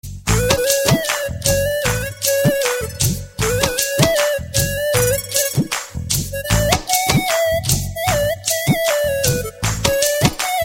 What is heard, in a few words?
Tamil